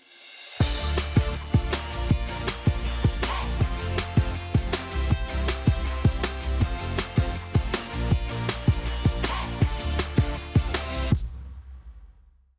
Jingle Gift Payoff; My Little Pony World; android

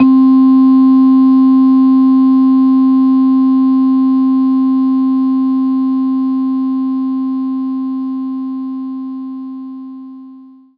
Marimba C4

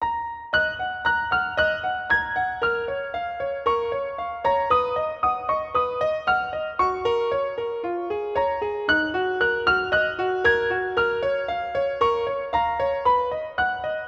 Singing Mountain, piano; Chrono Trigger

